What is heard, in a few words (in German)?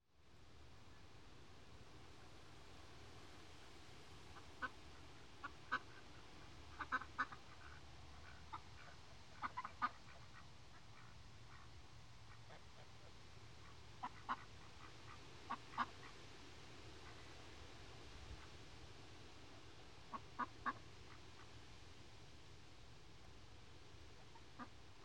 teich mit enten; geraeusche digital